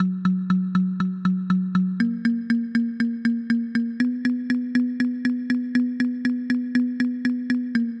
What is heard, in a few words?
Kalimba Click 120bpm